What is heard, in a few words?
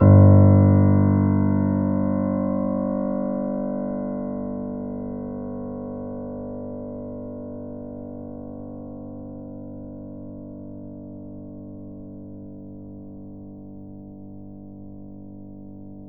Grand Piano G0 mf; Ableton Live 9 Essential Training